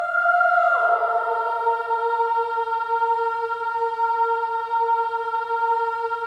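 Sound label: Voices, INTERVALS, 5TH DOWN; Spectrasonics; Symphony of Voices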